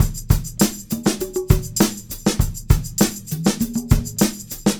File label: Combo, R&B Drums